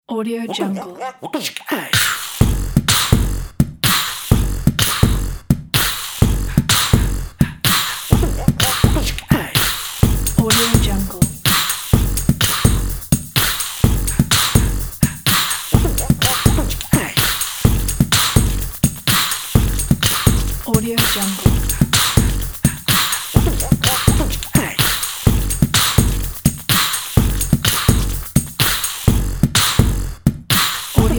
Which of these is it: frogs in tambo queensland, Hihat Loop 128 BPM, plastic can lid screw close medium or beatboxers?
beatboxers